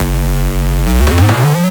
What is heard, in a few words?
Fill 140 BPM